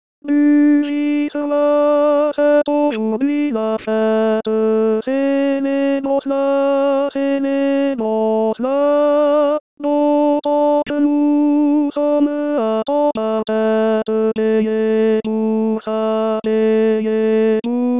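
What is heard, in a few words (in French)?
ténor voix seul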